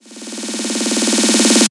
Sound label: Fills, Loops 140 BPM